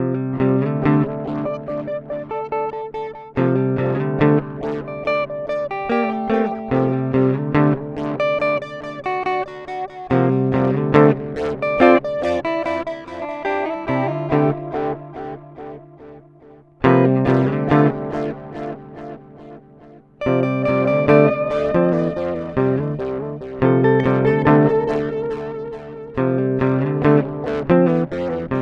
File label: Amplitube X-Time Analog